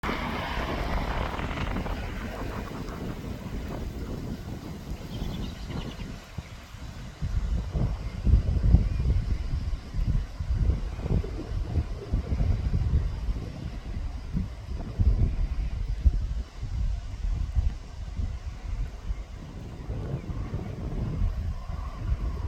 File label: fox